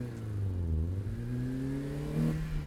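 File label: engine high gps turn; Blind Drive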